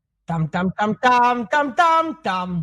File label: tam tam